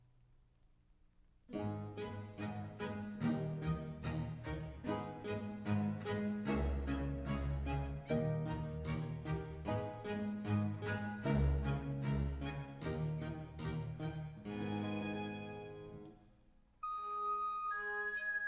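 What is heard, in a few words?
Concerto C major, Blockflötenkonzerte; Antonio Vivaldi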